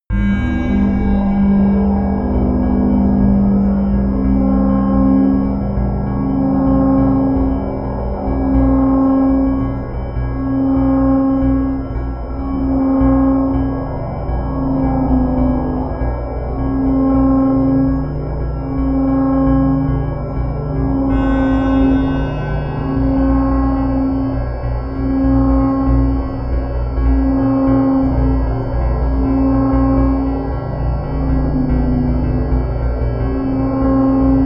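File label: fire storm, pads; flaechen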